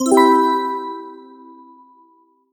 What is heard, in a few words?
loop start; neode-ui